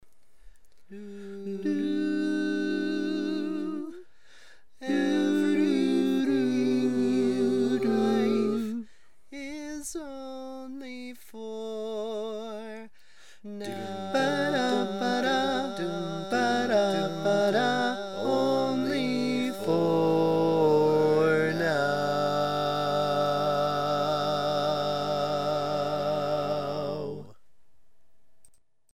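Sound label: baritone, barbershop tag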